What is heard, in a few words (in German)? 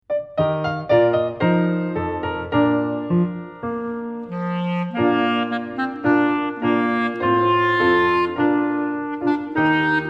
klarinette klavier; te deum